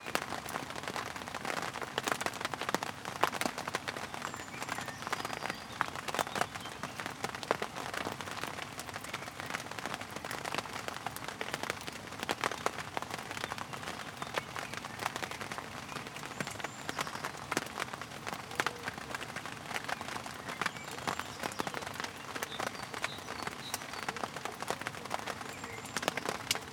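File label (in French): pluie cargo, rain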